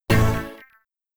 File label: Heavy Metal Jingle; amiga